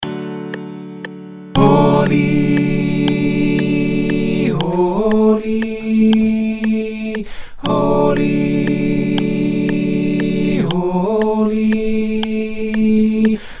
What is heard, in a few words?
allvox; music of heaven